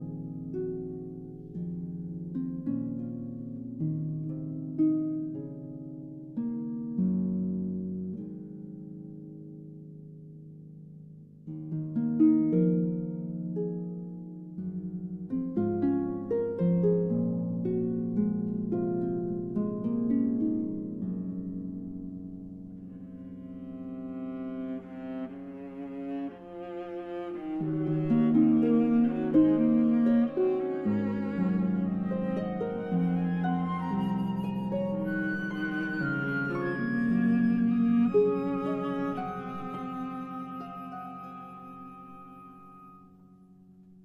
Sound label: Harp Concerto Lullaby